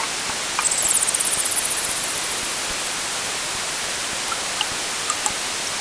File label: pond